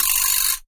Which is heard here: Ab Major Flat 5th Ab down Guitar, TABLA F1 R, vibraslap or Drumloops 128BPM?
vibraslap